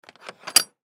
Semi Truck Trailer, Semi Truck Trailer Rear Door Latch; Semi Truck Trailer Rear Door Unlatch; Industry; Trucks; Vehicles